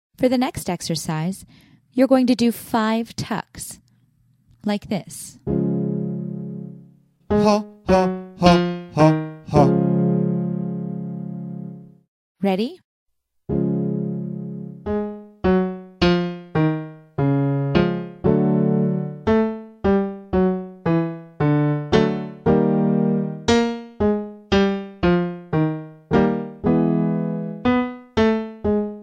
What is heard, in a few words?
Tuck male